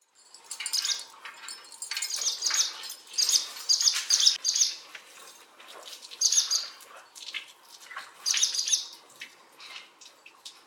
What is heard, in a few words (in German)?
rauchschwalbe; vogelstimmen